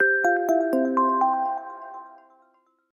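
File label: power off; LG T390K Wine 3G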